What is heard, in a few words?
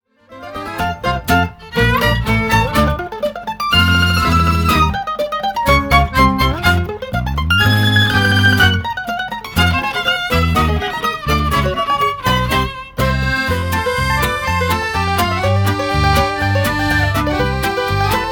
RussianRag